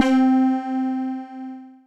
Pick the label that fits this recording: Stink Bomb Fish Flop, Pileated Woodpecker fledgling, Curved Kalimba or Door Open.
Curved Kalimba